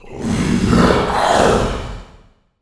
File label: growl